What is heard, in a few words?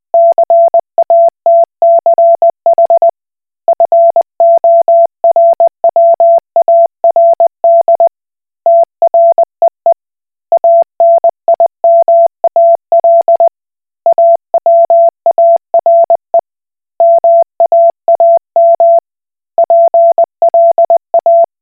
Koch Morse